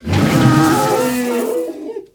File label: Death; Lightfused Stegadon